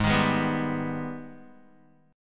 Ab Minor Major 7th, down, Guitar; strummed; AbmM7

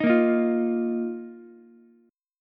Eb Suspended 2nd Eb down Ukulele; Ebsus2 strummed; JGuitar

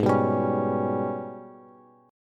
Ab Minor Major 11th Ab, Bass Bass Standard; strummed; JGuitar

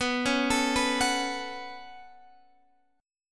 Db 7th Suspended 4th Sharp 5th B

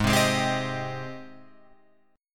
Ab Major 7th Ab down Guitar Open F; AbM7 strummed

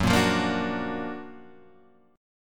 F sharp Augmented Major 7th F sharp; x,x,4,3,5,5,4,3

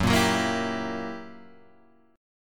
F sharp 7th Flat 9th Guitar Open G, chord; 4,3,4,3,5,5

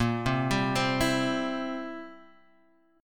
C Major, Bb, chord

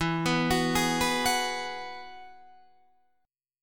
E Major Add 9th E down Guitar Open D

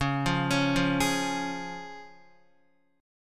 C sharp Major, Guitar; arpeggiated; JGuitar

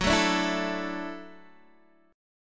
Gb Minor 7th Sharp 5th, Guitar DADGAD

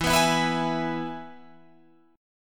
E-Major E, Guitar Drop D

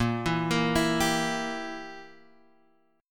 E Diminished A sharp

